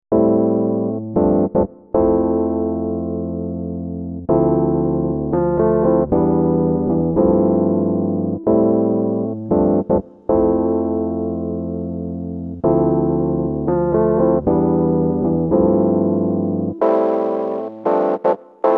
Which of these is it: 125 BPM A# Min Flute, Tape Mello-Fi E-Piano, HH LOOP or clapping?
Tape Mello-Fi E-Piano